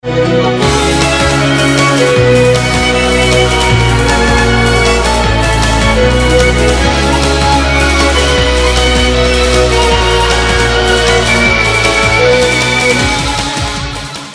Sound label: Silent Ensemble